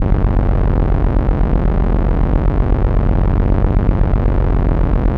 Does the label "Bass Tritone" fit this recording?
Yes